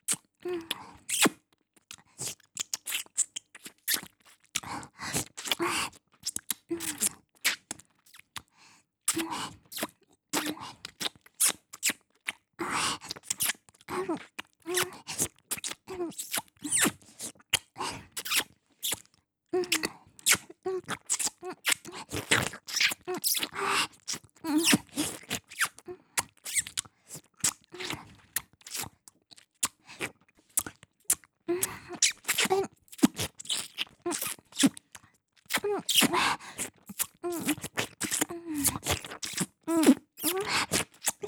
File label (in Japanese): フェラ